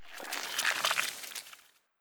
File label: Dryad Vermine